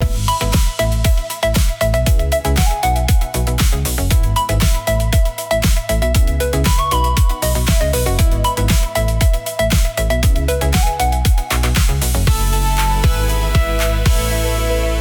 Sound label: loop; bgm; opening